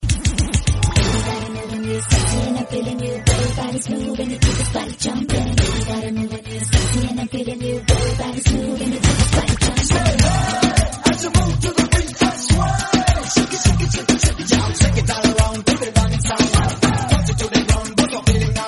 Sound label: nice bollywood song tone ringtone